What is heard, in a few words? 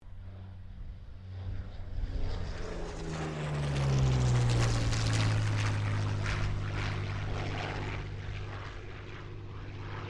AIRCRAFT P-40 KITTYHAWK PASS STEREO; Airplanes